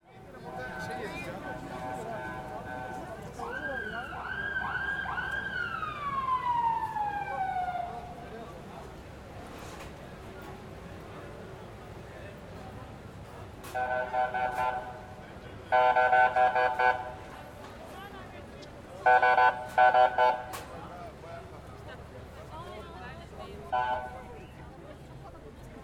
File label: Taksim Square, Traffic, Fire truck and police sirens, Tram, Crowd atmosphere, Turkish voic; Sound Ex Machina; SOUNDS OF ISTANBUL; Turkish voices